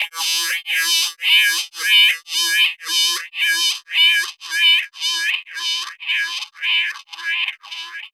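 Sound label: SYN 1, 112 bpm, Funk; USB Soundscan vol.41; AKAI; Roots Funk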